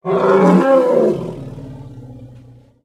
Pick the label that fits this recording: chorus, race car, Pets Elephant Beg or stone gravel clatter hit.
Pets Elephant Beg